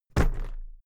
briefcase plastic drop

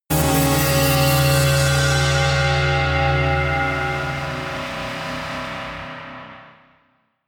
synth slice

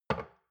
shot glass place table soft; soundpool

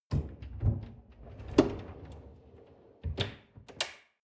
folding door open long

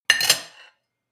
cutlery on plate place; soundpool

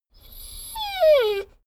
dogo argentino whine short

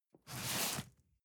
cardboard box medium slide short; objekte

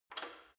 gym bicycle saddle unlock soft; sport freizeit; fitnessstudio

